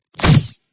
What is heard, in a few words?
weapon gun gunshot futuristic; PMSFX